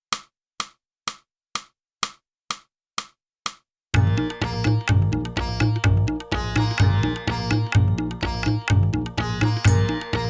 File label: clarinet, tiger leap; (Morgan) - Boosey Woodwind Method: Clarinet (Book 2)